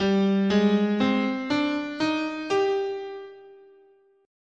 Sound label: G Japanese, scale